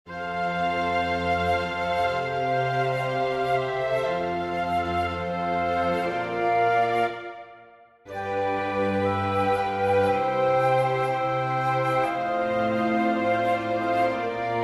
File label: for winds strings; purcell henry; praise the lord jerusalem